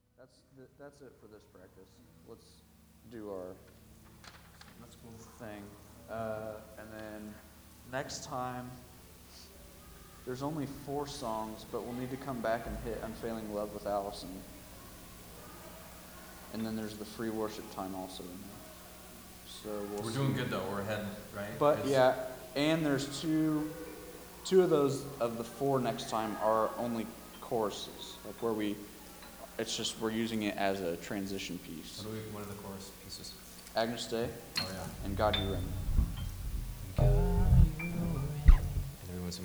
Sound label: Piano Bit